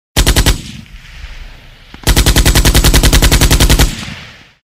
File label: Gun Shot